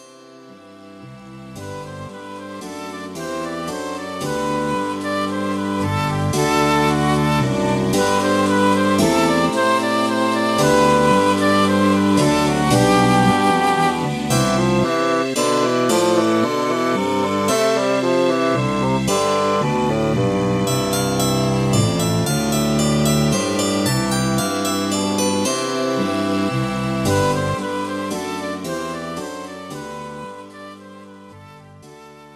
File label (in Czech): Její menuet